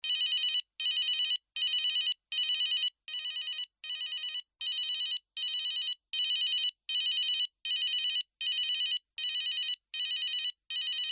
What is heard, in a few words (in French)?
Sonnerie